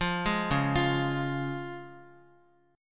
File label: Db Major Db Guitar; arpeggiated; JGuitar